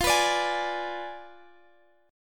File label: F Diminished 7th Guitar DADGAD